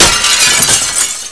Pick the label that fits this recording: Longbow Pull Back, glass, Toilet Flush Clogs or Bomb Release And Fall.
glass